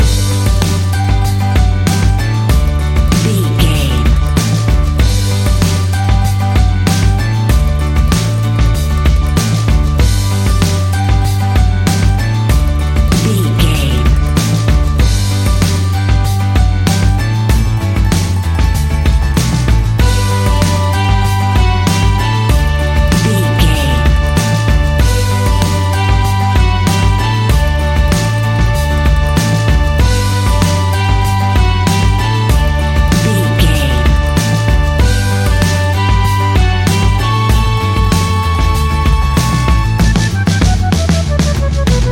happy folk sunrise music, Happy sunrise country folk music. A raw and earthy piece of traditional folk music